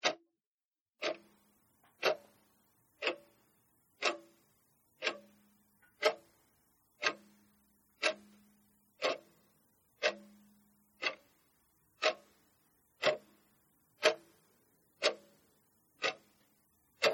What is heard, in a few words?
Wall Clock, Wall Hanging Clock Tick Tock Loop; Clock Sound Effects; Machines